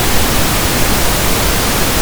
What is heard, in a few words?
noise